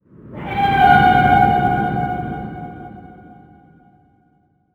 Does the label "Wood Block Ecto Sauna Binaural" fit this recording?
No